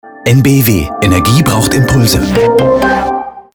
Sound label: Sound Logo